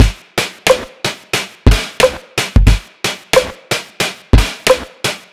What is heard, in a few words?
Rimshot